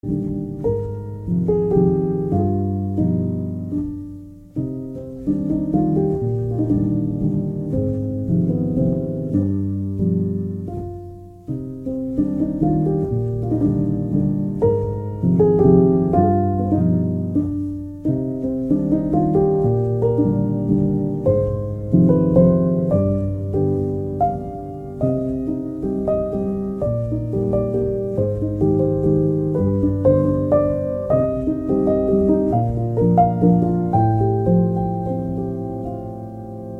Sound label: Waltz